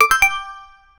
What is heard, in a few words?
reward sound for game, achievement